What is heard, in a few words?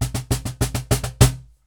Cajon Fill; musicradar